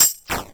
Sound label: Crushed Perc, bitcrushed; musicradar